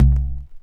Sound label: Modular Squeezy Perc Vinyl Synths C3